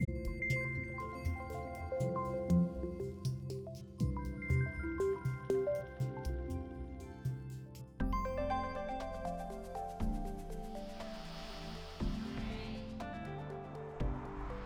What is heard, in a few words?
Atmos Bounce